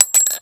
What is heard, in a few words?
ammo casings